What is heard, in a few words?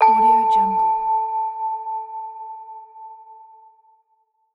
secret found; relaxingambience